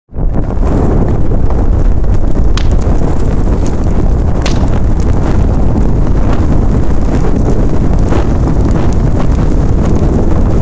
FIRE BIG WINDY Fire sound effects

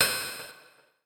Futuristic Weapons Sound Effects, link

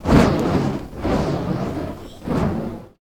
Fire Staff, Fire sound effects

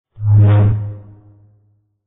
lightsaber

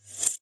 Futuristic Weapons Sound Effects

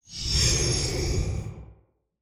sfx, futuristic weapons sound effects

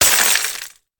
bullet hit glass; Fire Weapons Sound Effects

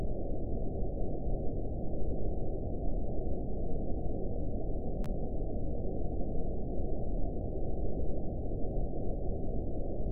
Hollow Wind

